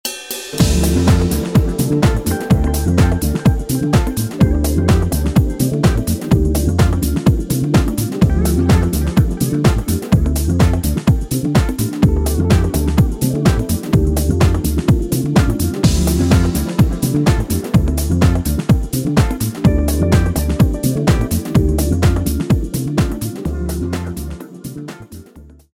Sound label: Latin Band; Miami Latin Band